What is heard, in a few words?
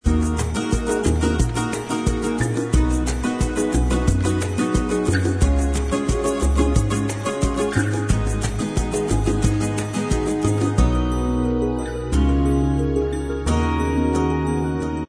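bossa style instrumental Track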